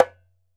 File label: ASHIKO, African Drum